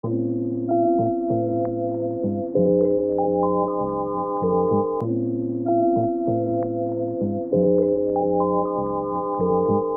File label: bass guitar